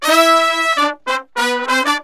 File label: BRASS SAMBA; World Grooves; Best Service ProSamples vol.14; AKAI